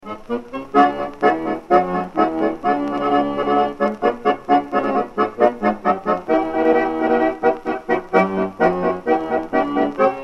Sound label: sharpshooters march; pietro deiro anthology